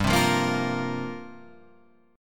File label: C 7th Suspended 4th G